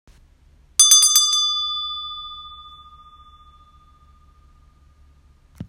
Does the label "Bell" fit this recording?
Yes